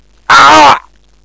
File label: pain; svencoop; paranoia; diversant